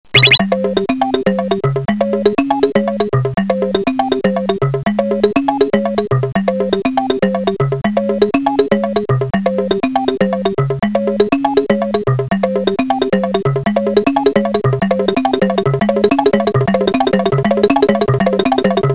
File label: pow; marioball